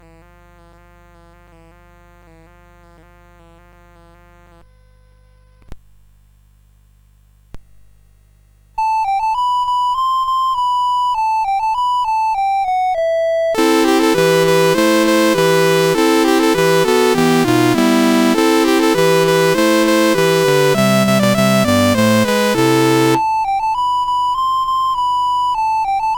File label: Noel des Oiseaux, CSG8580R5